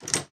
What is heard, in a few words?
equip; action RPG game; Adventures in Lestoria; Sig Productions